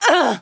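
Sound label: female hit; WarRock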